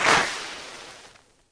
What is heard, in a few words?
fire hit